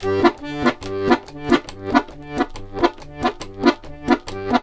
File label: 140, Complete Accordions